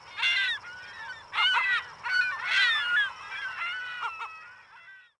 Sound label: GULLS, water; zones